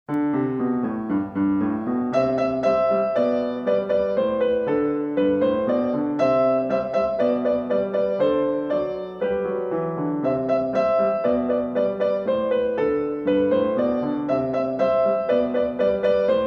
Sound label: piano